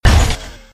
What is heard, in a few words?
Mech Jump; doodle jump 2; android